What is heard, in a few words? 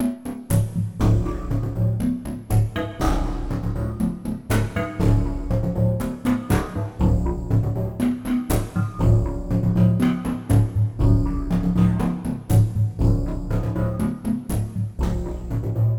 Strange Beat